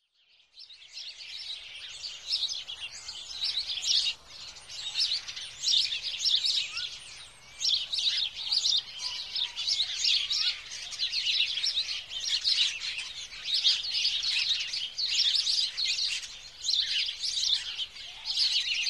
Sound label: Constant Bird Chirp